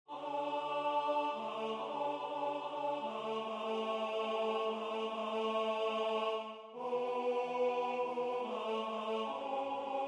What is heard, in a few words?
tenor